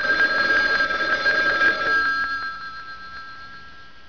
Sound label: phon ring; sunrisechat